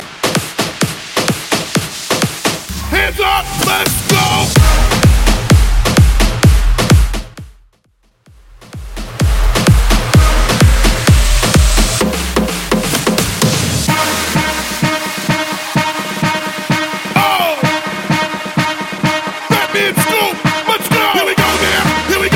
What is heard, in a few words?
Bootleg Mash Up Festival Mix 128 Bpm